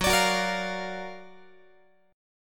Gb Augmented Major 7th Gb down Guitar 4A,4E,5A,5D,5G sharp,6C sharp; Listen to Gb+M7 strummed